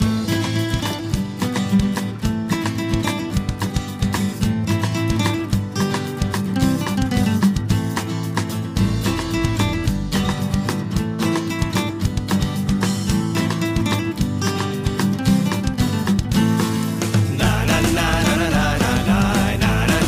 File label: ringtone; Captain Blood